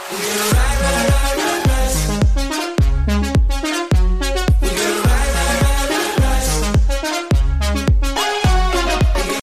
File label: remix ringtone; iphone 7 plus 2018